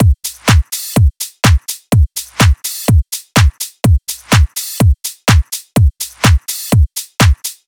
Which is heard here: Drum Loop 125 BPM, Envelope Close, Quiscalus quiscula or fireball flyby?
Drum Loop 125 BPM